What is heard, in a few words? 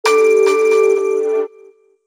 MELODY